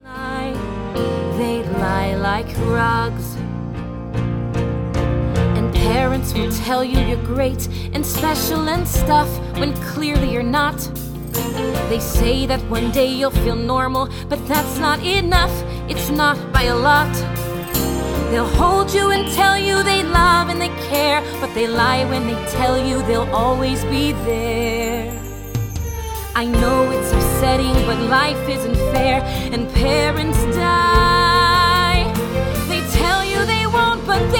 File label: Vocals